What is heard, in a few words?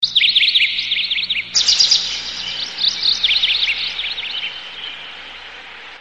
chirping birds nature sound tone; galaxy ringtones